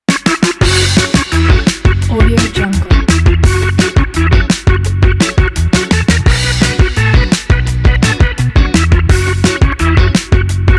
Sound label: the quirky upbeat food